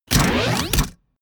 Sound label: wind up